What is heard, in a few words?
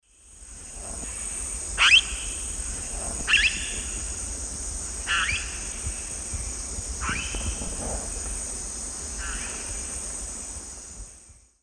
Pionopsitta barrabandi, flight calls; S. America; Eastern Lowlands